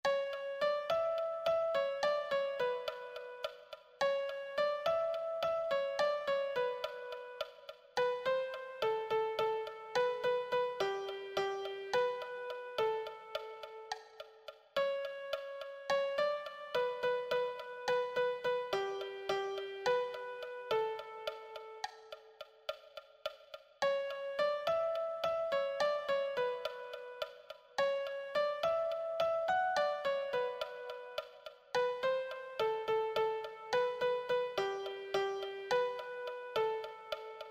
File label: lead, piano